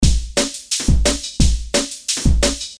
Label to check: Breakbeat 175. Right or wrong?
Right